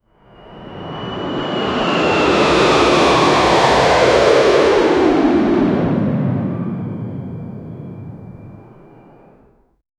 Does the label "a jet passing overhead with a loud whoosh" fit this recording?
Yes